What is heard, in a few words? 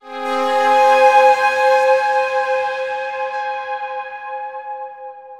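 Synth F minor; Torchlight III; PS4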